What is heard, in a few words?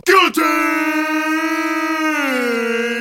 Guillotine